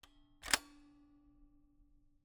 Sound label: Camera, Kodak EK2 Instant, polaroid, large, plastic, springs, release; Eiravaein Works; Vaeyan IV